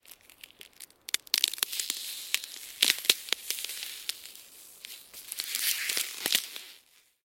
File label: Ice crackles; SyrinScape SoundsScape Collection; bbh Haunting part 1; TTRPG